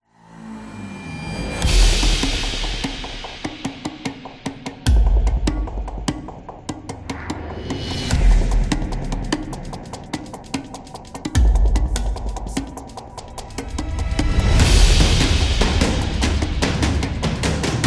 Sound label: Drums Epic